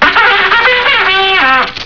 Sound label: bugle